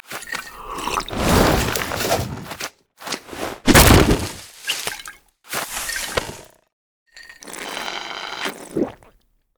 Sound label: recall leadin; LoL; leesin skin05